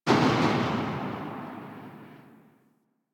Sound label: Assault Rifle Tail; Weapons; Warframe; Grineer